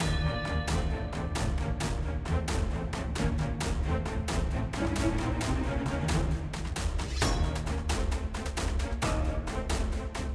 Boss Intense

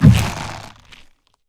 Vine Hit

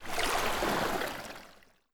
Water Splash Soft

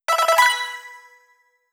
Level Up!; Pokémon Legends Z-A; Switch 2

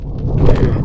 Snowball hit loop; Talking Santa; Android

